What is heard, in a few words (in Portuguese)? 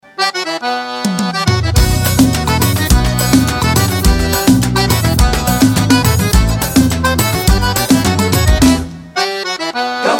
toque xote